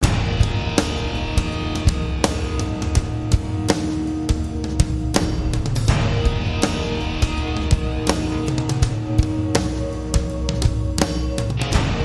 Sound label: Rock Steady